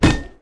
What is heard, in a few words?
yeti vs metal; nunu